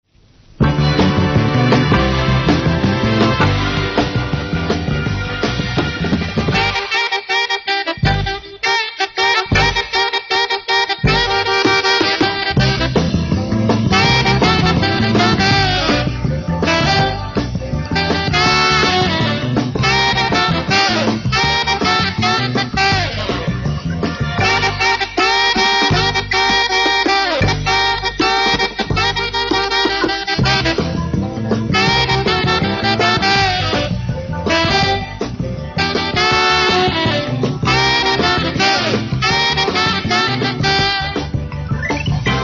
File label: flute